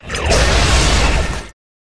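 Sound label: Rat buff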